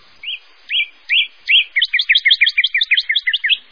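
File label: sound of a playful whistle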